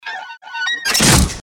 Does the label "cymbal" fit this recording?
No